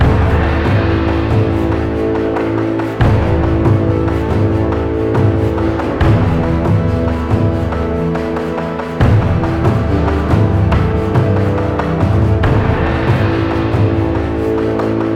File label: track battle a loop; warcube macos windows gamerip 2017